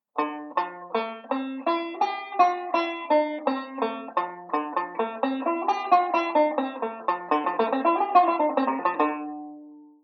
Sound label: Modal Speed Drill, banjo